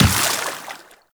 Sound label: Tenno Run Water, Footsteps; Warframe